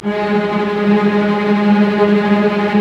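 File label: STR SEC, strings; Roland - LA Composer vol. 1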